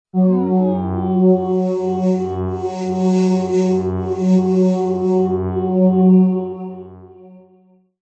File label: Pad; Crimson Sea Pad